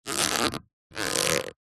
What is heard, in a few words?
rubber squeaks; foley; zapsplat